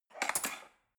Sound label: cutlery fork drawer handle scrape soft